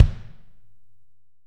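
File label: AMB KI, ambient kick, studio; AKAI S6000 CD-ROM - Volume 3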